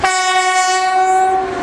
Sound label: horn